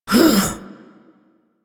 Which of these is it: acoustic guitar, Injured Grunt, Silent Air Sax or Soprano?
Injured Grunt